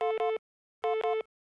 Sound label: phone beep; unofficial tex murphy